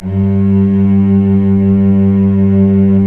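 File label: strings, mf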